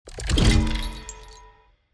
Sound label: ambience room portal starting